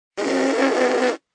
Mouth Blowing, Mouth effects, 1 child, wet, raspberry, human voice, kid; Mouth Blowing2; VoiceBox